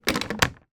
household hairdryer hotel place into wall mounted holder